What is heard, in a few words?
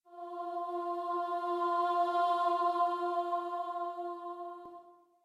starting note, voice; singing in a swing